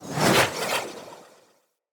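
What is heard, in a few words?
Staff Caster Projectile Whizz By; Weapons; Player; Staves; Starter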